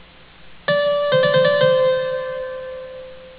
Accent und Trillo (appogiatura with trill; Palmer: "appogiatura and trill")